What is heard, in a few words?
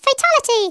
error; WoWInterface; BugSack